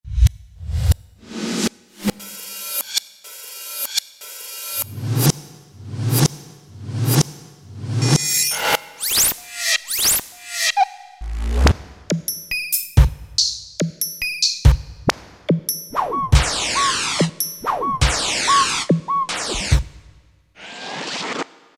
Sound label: RHYTHM FX, Factory Rhythm Sets, Roland SC-880